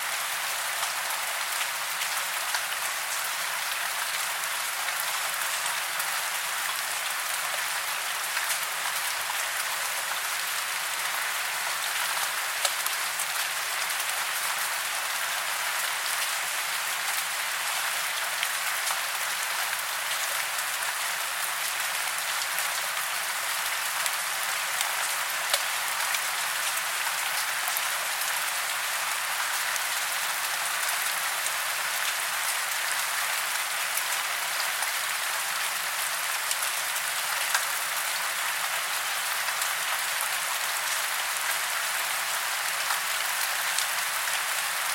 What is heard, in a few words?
long rain